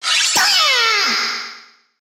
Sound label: ninja attack